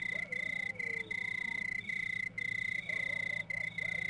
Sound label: night time; Team Alligator